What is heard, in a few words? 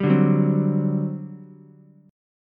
G Major 7th Suspended 4th, G, down, Ukulele; GM7sus4 strummed; JGuitar